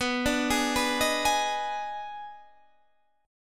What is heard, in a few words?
Eb Major 7th Suspended 4th Sharp 5th B